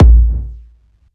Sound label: sub, sub bass; Techno ID; subbassdrums